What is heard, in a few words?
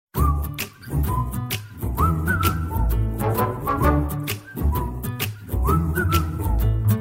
whistle msg ringtone